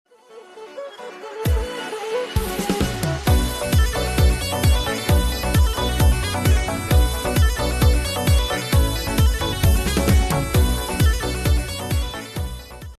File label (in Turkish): Karadeniz Zil Sesi